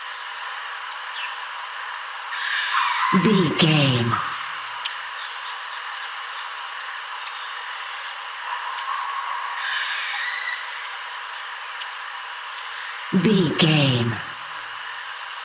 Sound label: swamp bayou frogs birds daytime loop